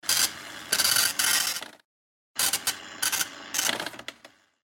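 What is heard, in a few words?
Receipt being printed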